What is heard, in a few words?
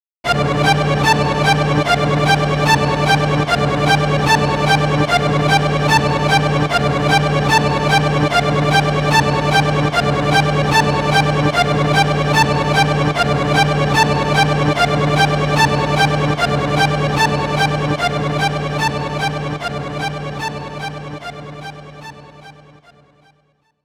Gramophone; Clock Tower 20th Anniversary Sound Collection